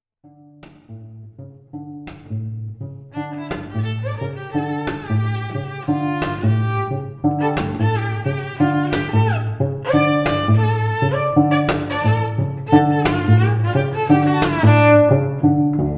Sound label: String Duo